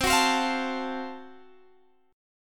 F sharp Minor Major 7th Flat 5th C

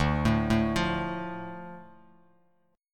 D Minor D Bass Standard; Dm arpeggiated; 10,12,7,10; JGuitar

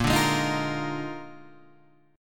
Bb 11th, Guitar, Drop D

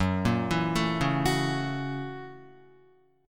F sharp 7th Sharp 9th, Guitar; arpeggiated; JGuitar

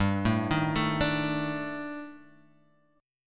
D sharp Major 7th Flat 5th G

